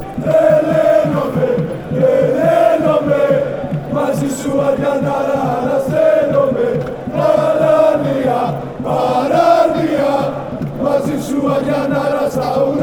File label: aris chant